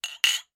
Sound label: household glasses tumblers x2 clink; sound effects one